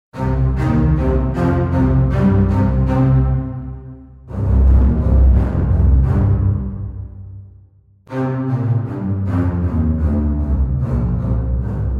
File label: String Ensemble Bass staccato